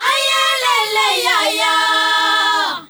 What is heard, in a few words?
ZULU CHR, FEM. CHOIR